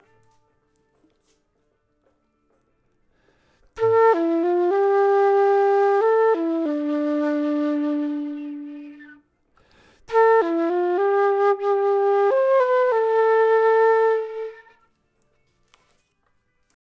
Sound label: flute